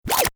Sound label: Turntable Scratching; DJ (Sound Effects:Musical)